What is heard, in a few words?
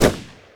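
m 16 exterior shots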